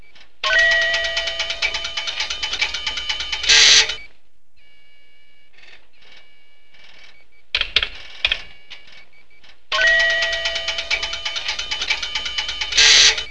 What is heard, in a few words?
computer